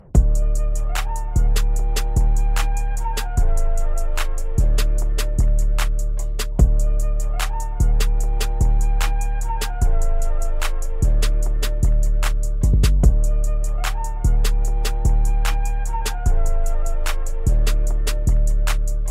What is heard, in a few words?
instrumental ringtone